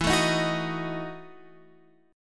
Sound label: E Major 7th Suspended 2nd Suspended 4th E, Guitar, Open F; strummed